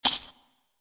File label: chip raise, chips; Caribbean Beach Poker; wazdan